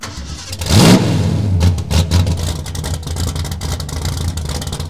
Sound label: Mustang GT350 Start Loop; Automobiles